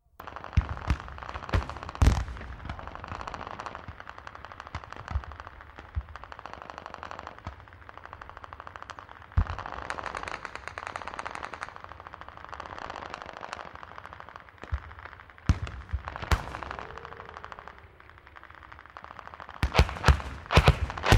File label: Battlefield atmosphere (Close perspective); Battlefield atmosphere, distant perspective with some close explosions; Battlefield Sound Effects; BBC